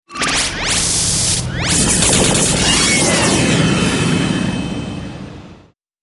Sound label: Gamer Game Master Alert